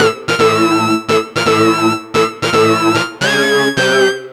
The Techno Riff, Keyboards

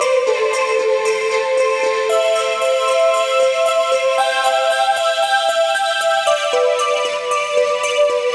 115 A Seq; Midnight Run; Zero-G - Future Wave

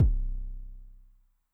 Bass Drum Pitch Extra Low, 909; classic machines; BD - BASS DRUM

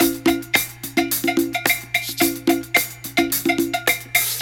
loop, Roland; Sound & Vision - Gigapack I

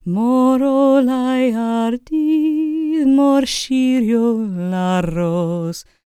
CELTIC, Vocal Planet World Voices; ILIO; Vocal Planet VOL-5

